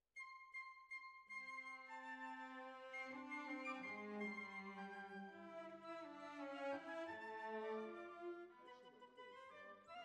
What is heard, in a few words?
Violas, jazz for piano